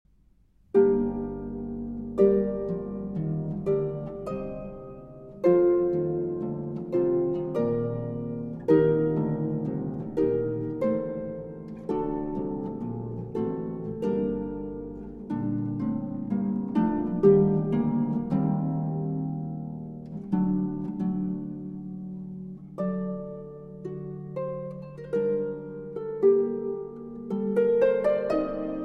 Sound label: Concerto in B-Flat Major For Solo Harp Larghetto; HWV 294; By Marcel Grandjany